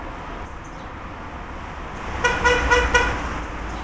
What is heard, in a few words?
Impatient Car